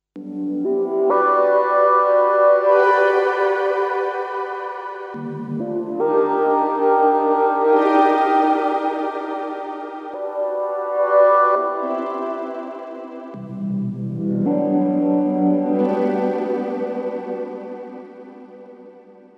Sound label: Reason 10 Grain